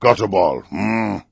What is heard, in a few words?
hmm; Gutterball 3